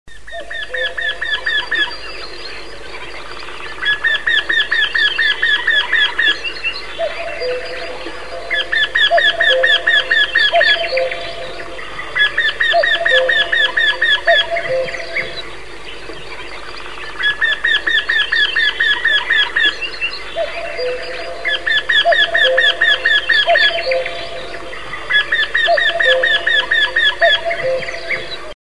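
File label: kestrel, cuckoo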